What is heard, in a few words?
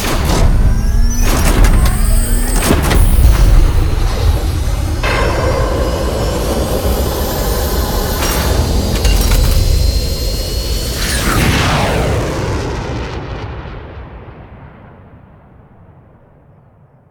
launch, combat suit; CosmicRage; CosmicRageSounds